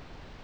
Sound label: ambience